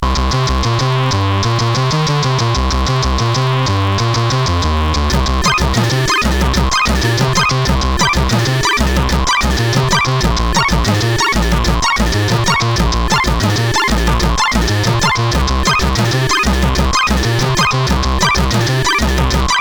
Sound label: Nilfisk, CSG8580R5; hvsc